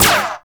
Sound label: LASER, Tekno Industrial; East West